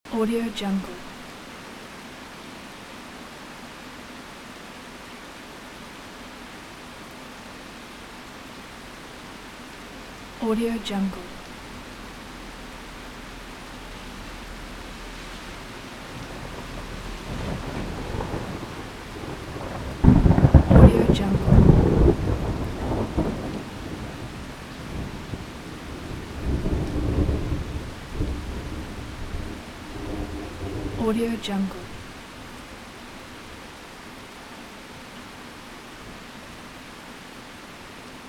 august storm with intensive rain loop; صدای طوفان شدید و بارا; nature sounds

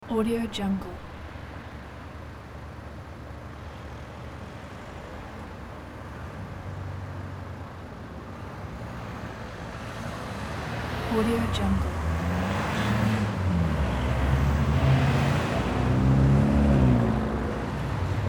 junction cars traffic engines airplane; urban sounds

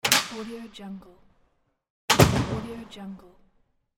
metal building door; domestic sounds

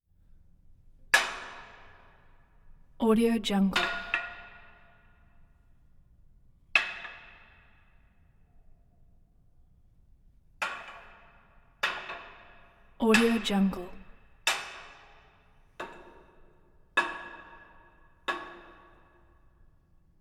prison bar hits; ضربه به میله فلزی; industrial sounds

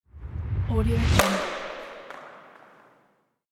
badminton whoosh; transitions and movement

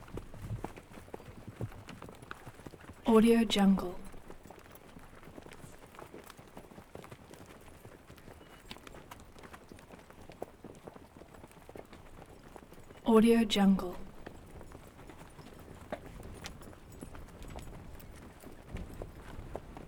military cavalry horses run med constant circle; game; صدای پای چند اسب

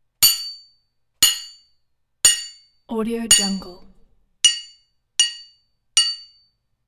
hammer hits metal; industrial sounds

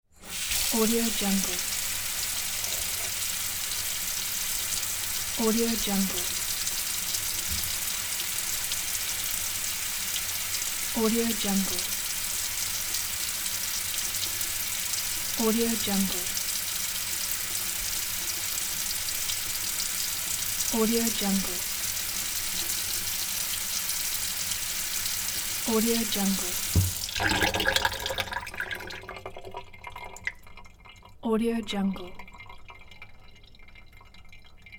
kitchen sink; domestic sounds

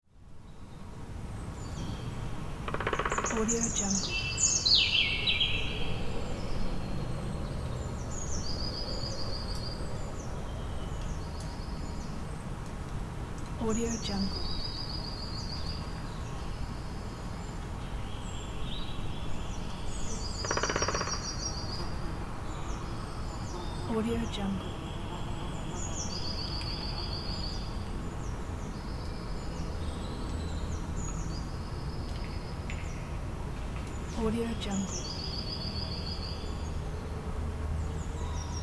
forest ambience with woodpecker birds bumblebee; nature sounds; محیط جنگل با صدای حیوانات

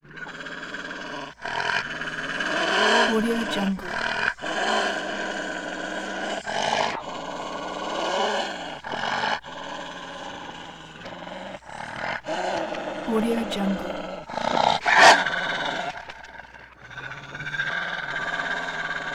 wolf growl

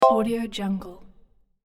interface pluck chord up; interface sounds; تک کلیک کوتاه

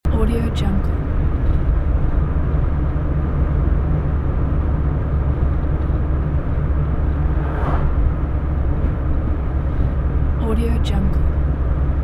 driving car with opened windows during weak rain

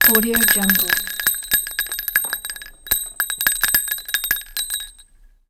rounds metal drop onto smooth tile floor; game sounds; افتادن قطعه فلزی رو کاشی

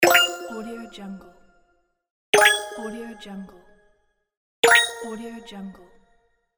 space prize; game

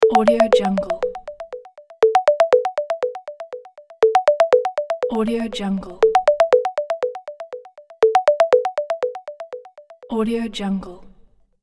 waiting ringtone; interface sounds

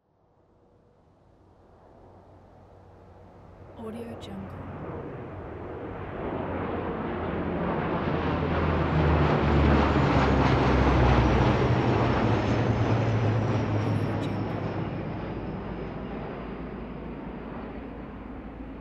four military planes flying by

